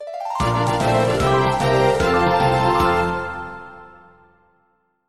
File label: Victory; ycrpg